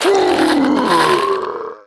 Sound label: Turtle die; Eft